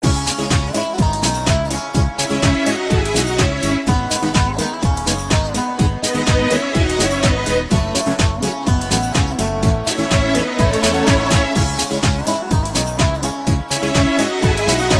Persian Classic

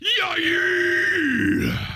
YaYEEEAAHHH

